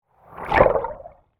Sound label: Underwater Pass By, Water, Underwater, Movement, Pass, By, Hydrophone; Water Sound Effects; BlastWave